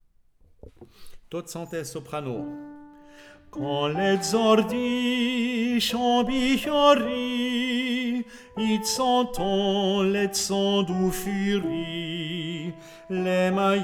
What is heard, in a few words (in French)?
Chants To Tsante S